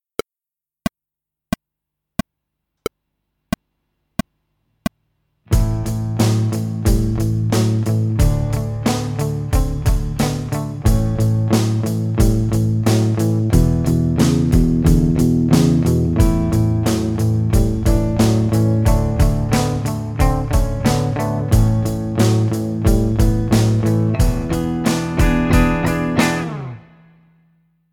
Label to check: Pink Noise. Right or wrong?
Wrong